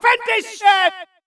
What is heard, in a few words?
yells